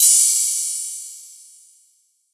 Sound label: Ride; Cymbals; Ghosthack; Color Bass Essentials